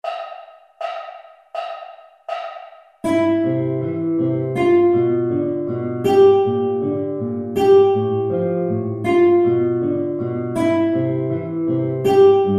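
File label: 1st string, guitar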